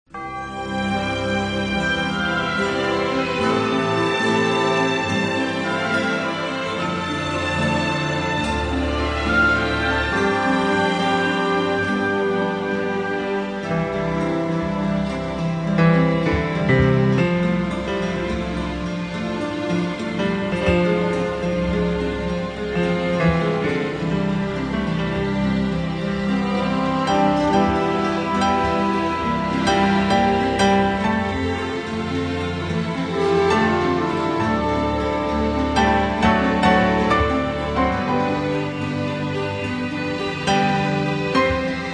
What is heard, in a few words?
Orchestra, Strings; Majesty Orchestra